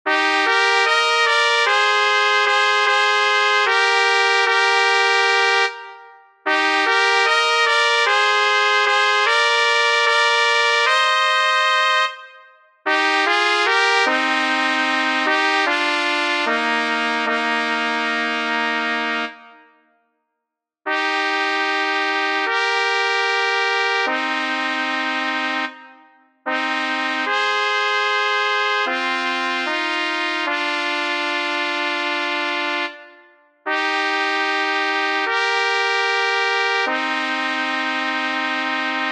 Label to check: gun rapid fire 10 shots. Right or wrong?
Wrong